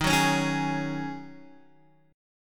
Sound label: D sharp Minor 11th D sharp Guitar Open D, chord